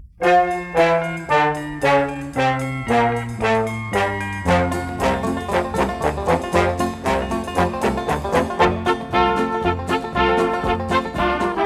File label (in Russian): Веселые колокольчики - оркестр